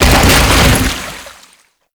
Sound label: Bodyfall Water